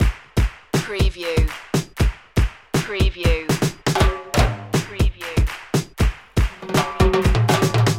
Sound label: latin jump loop